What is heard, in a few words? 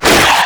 Infested Runner Arm Attack H; Enemies; Abilities; Warframe; Proto Infested